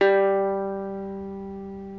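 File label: reference pitch mandolin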